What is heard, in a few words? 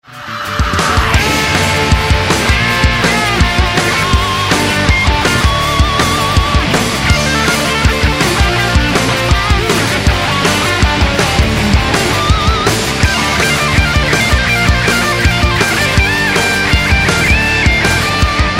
Guitar